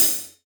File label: Real Drum Kit, JUNGLE KIT